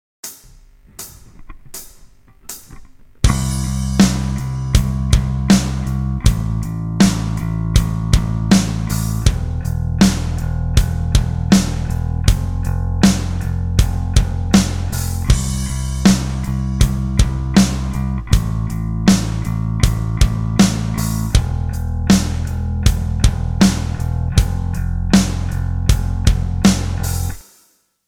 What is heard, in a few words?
Backing Track; chord progressions mastery